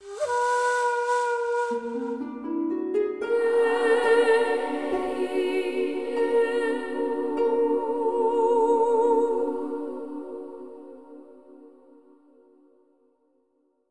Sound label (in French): Sonal Decouverte; Echo Secrets of the Lost Cavern